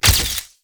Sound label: win symbol Flash; netent